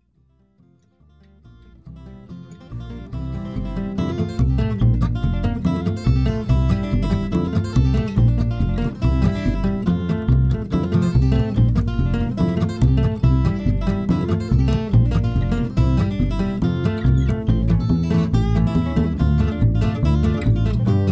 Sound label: guitar